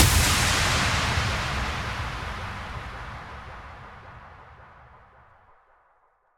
Slap Move Impact

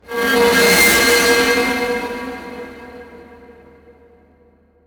Screaming Metal

